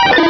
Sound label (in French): Cri